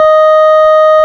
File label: ORG, Farfisa Clarinet; Roland LCDP09 Keys of the 60s and 70s 1